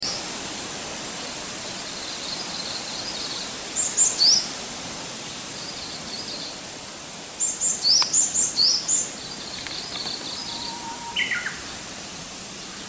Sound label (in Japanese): mebosomushikui